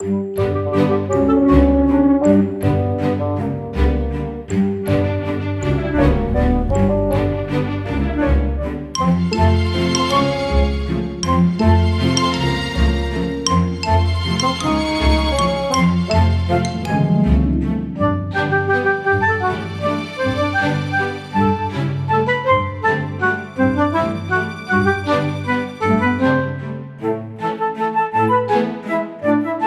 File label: Tune Loop; Fable Heroes